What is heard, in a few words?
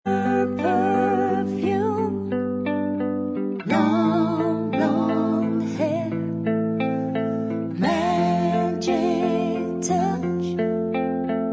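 backing vocals, backing tracks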